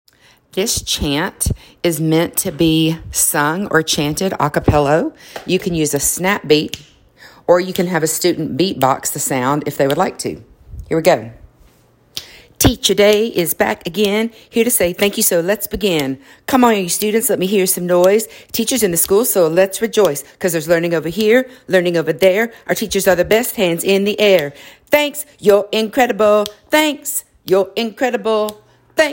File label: teacher chant